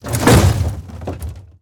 plastic break; teardown; ps5 windows xbox series xs

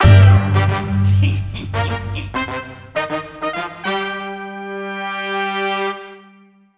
Disco Ball Stinger Idle; Brainstreet Objective3

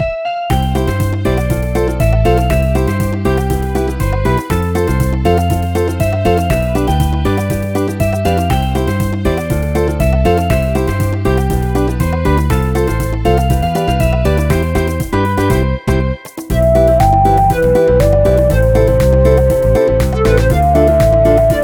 BGM; Heroland

